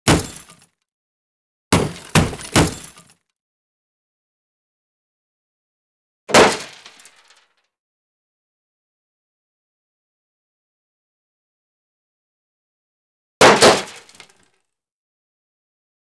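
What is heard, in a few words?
bullet impacts metal; Asian Show Sound Effects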